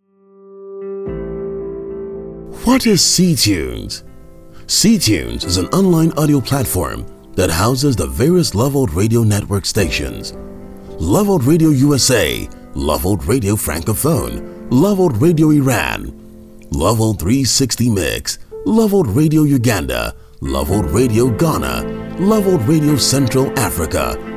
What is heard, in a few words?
JINGLE